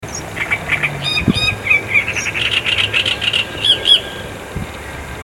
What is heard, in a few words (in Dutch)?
Zingende Grote Karekiet